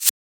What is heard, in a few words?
Shaker; FLPRO